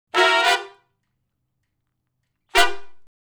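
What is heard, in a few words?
R&B Riff (G) harmony; Sections; Crimson Blue and Fabulous Horncraft 4 RnB; Horncraft for R&B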